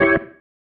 Stab, Organ, One Shots; Loops; Stabs; Sonic Foundry (Sony Creative Software); Joe Vitale Organ Donor

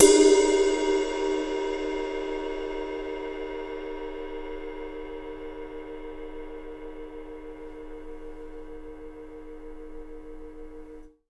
RIDE22 BRU; Big Fish Audio; Ross Garfield Drums VOL-2

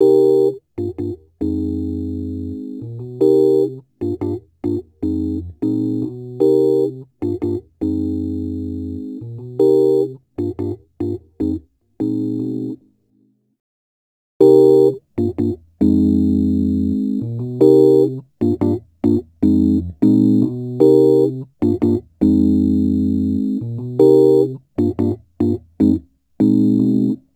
Rhodes Attacks; Ueberschall

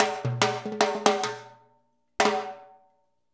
FILL, Percussion

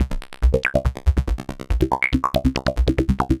Synth

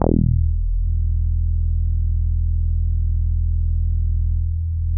bass, synths

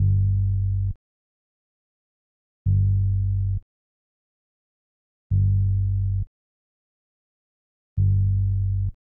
bas G, Hip Hop & RnB; Makin Moves

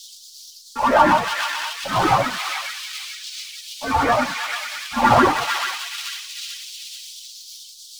Tape Arp; Sonic Foundry (Sony Creative Software); Ma Ja Le Ethereal Textures; Noise Percussion

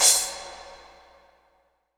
IMP CYM CRASH, Cinematic Impact

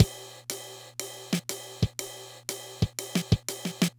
Loop, dd-5

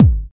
Bassdrum Clean; Best Service; House Definition VOL-1

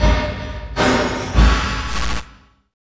FX, Industrial Loops

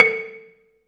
BELA2 A3, BELAFON, Asian Traditions; USB Soundscan vol.60; AKAI

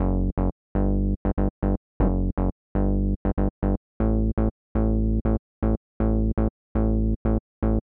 Bass, Happy People 120bpm D, Nu Disco; Zero-G

